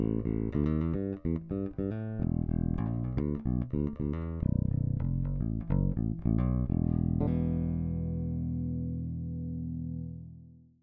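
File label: Split Jazz Passive Bridge, 5-String Pickup; Fralin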